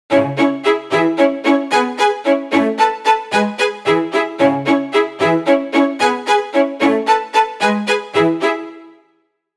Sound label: Strings